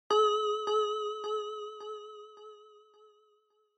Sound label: Single Accent Low Delay